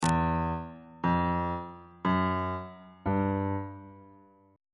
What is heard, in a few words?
f flat 2nd interval pitches on bass clef